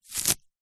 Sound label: grass pick pull rip from ground; zapsplat; foley